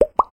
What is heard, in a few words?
Bubble Gum, notifications; Tecno Pop 9 4G